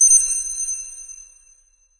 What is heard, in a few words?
amb random tech; video games; ambient; random abstract; source engine; portal2